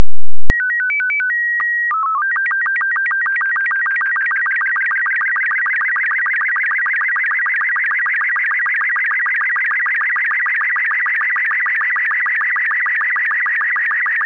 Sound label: Robot 36, SSTV